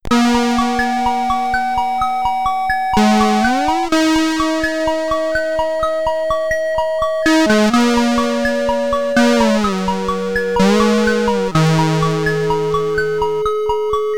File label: Fight BGM (SID Stereo); way of the exploding fist the commodore 64